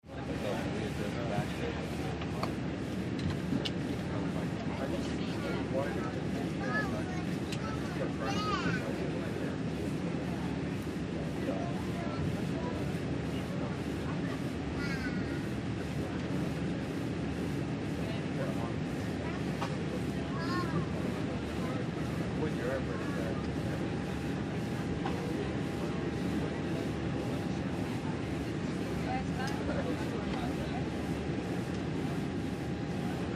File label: Boeing 747 jumbo jet, interior, taxiing, many p.a. announcements